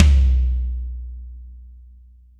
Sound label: tom 16; Best Service; Real Mega Drums VOL-1